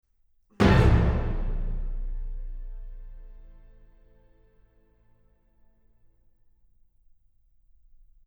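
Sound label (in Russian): оркестр завершающий аккорд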